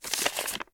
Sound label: Document Opening